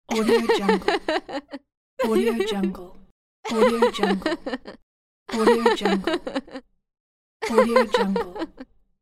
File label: girl short laugh pack